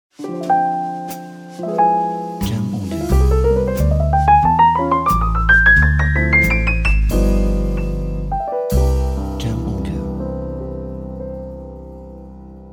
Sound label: Slow Jazz Intro Logo; Slow Jazz Intro Logo Pack